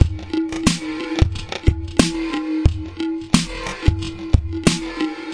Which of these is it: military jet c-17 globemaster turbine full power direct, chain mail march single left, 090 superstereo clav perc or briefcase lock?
090 superstereo clav perc